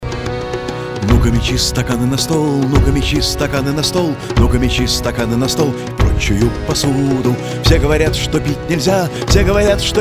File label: rap